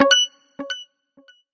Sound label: notification